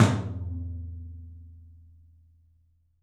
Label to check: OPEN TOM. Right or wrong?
Right